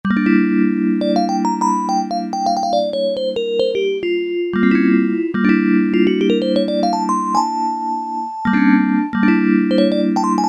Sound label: VIBRAPHONE, Yamaha SHS-10